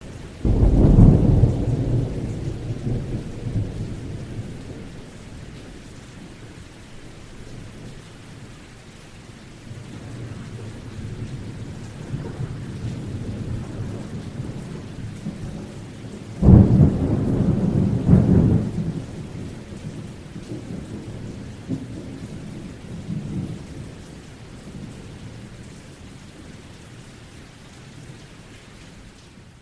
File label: storm sounds